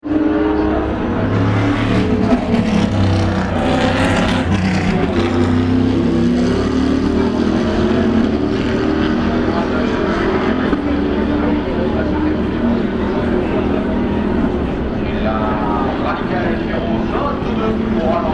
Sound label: corvette start finish, 24h le mans